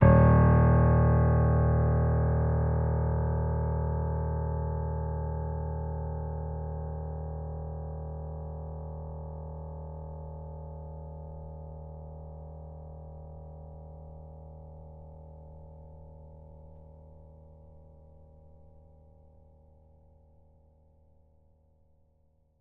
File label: D sharp 3, piano